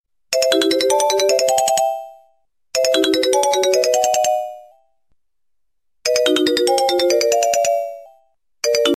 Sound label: sony tone ringtone